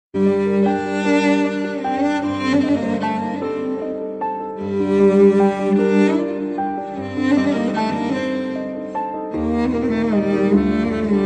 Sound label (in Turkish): Violin Ringtone Telefon Zil Sesi